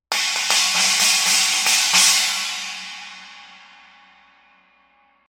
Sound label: Sabian 18 AA MetalX Chinese